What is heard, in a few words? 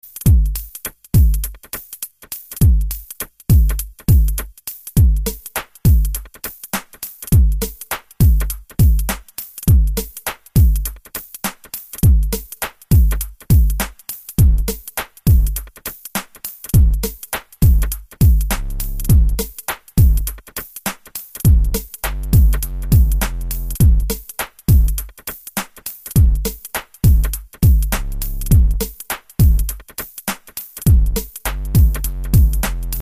hip hop